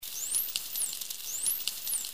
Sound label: SW, dolphins signatures; Jampa